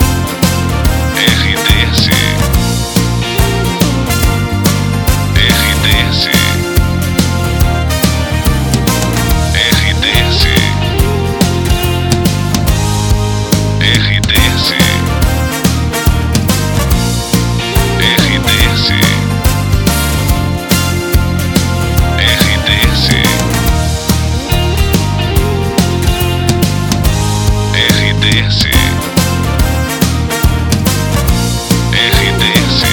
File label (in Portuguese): trilha para locução; Oeste